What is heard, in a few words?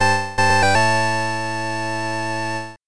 Item Card Get; Hyrule Warriors Definitive Edition